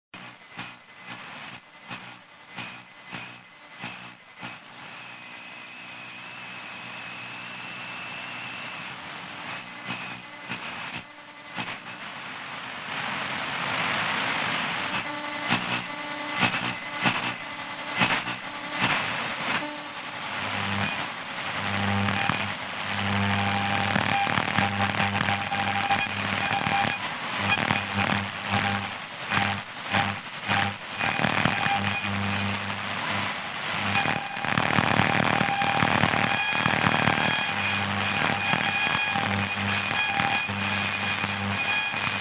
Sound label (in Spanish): larga interferencia de radio modula efecto de sonido